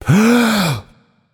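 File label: non verbal breath, voice; cernunnos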